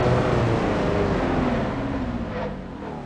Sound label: throttle off; TDMCarpack; TDMCars